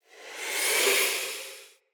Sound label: Sword Melee Wind Up; Weapons; Player; Swords; Fenn Jotar Sword